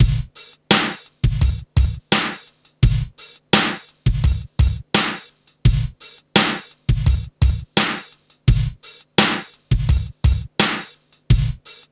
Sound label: HIPHOP, Funky; INIS; Funky Xtreams